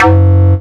Synths C; Vengeance Samples

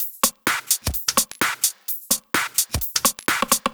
Drumloops 128BPM

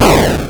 Arcade Riffs

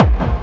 Bassdrums Hard Kicks; Vengeance Samples

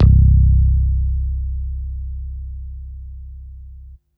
Basses C; Vengeance Samples

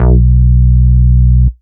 Root B, Ultimate Bass; Vengeance Samples

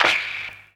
Snares & Claps; Vengeance Samples

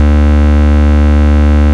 Bass D, Root D

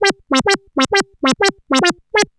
Arp D Line, ARP Odyssey; musicradar-synth-samples

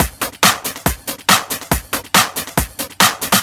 Breakbeat; Vengeance Samples; VEC2 Loops

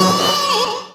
Synths F#; Vengeance Samples

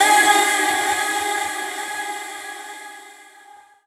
Reverb Athmospheres, FX Vocals; Vengeance Samples

